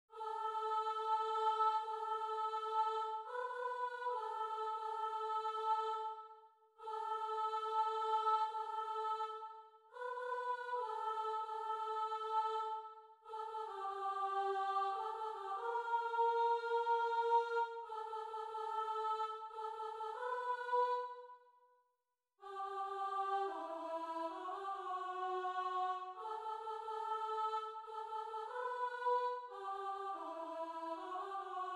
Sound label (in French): Soprano; L oiseau